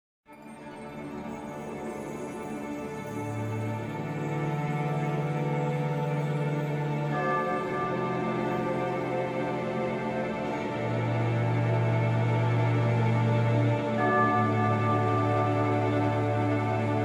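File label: Music Cue Secret Contrabassclarinet; Crusader Kings III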